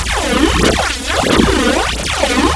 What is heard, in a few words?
Pulse Bolt; Monster Hunt